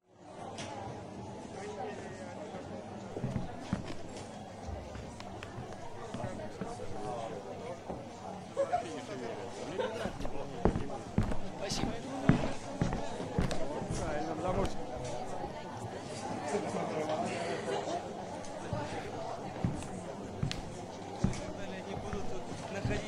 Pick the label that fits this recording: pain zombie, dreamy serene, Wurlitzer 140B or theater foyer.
theater foyer